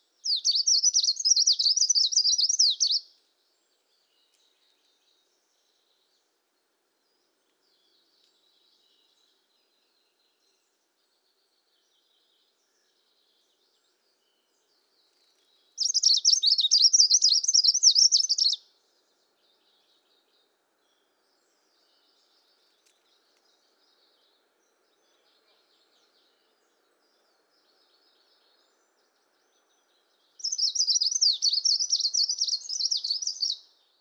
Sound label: plumbeitarsus song